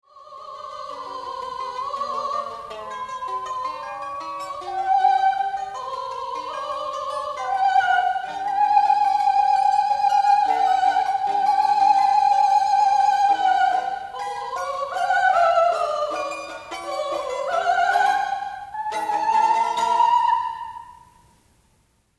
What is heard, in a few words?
Vocalise